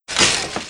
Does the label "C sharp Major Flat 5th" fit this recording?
No